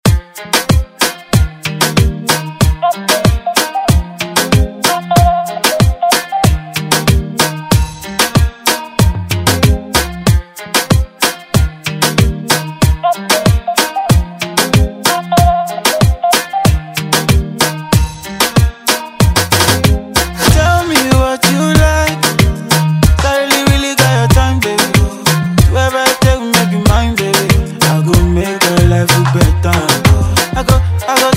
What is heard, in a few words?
Afro Moombah; Redrum